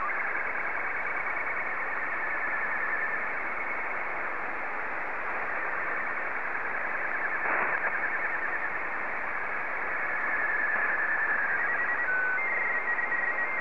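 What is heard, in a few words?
Robot 12 black and white, slow-scan television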